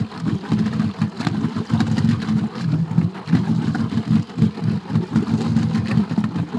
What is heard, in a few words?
idle, Engine; Splashdown; XBOX